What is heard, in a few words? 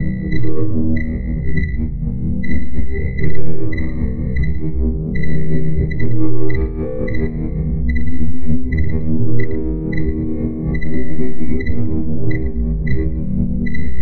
ambience